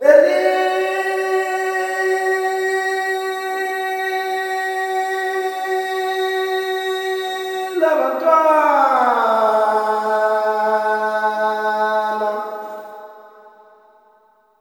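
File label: MALE MOURN, MALE CALLS; Spectrasonics; Heart of Africa 2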